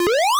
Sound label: jump; sonic-radiance